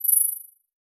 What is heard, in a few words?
Multimedia Internet CD-Rom Flash Beep Multiple